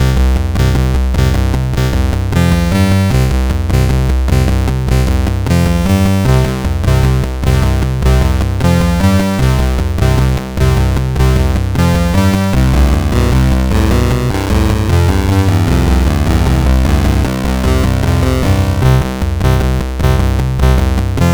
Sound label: I Wanna Dance, CSG8580R5